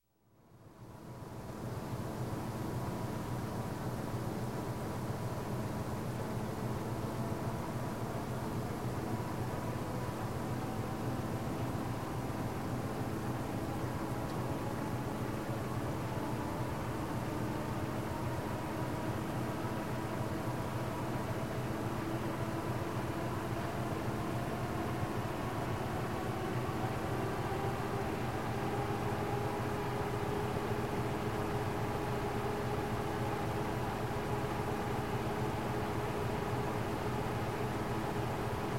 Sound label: Newspaper Press Slow Start Up And Run, Room Ambience; Sound Ideas 2000 Series